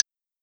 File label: Clave, Korg SR-120; Drums